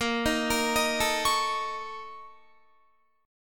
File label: A sharp Minor Major 7th Double Flat 5th A sharp, Guitar; 18,18,20,20,21,20; 4E,4A,5D,5G,5C,6F; arpeggiated